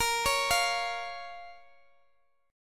Bb Minor Bb down Guitar Open G

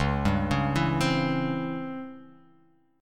C sharp Minor Major 7th Flat 5th; C#mM7b5 arpeggiated; x,4,5,6,4,7,x,x; JGuitar

